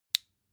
flashlight release

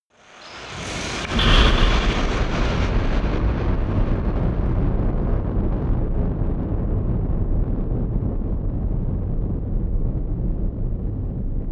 eerie burst large

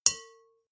bowl hit soft

